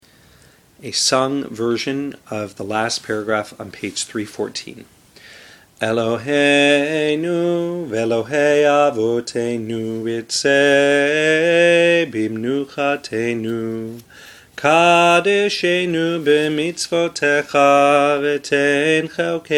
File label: eloheiun singing, shabbat tunes; shabbat ma'ariv